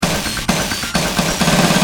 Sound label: Sick sn, Industrial, Drums Perc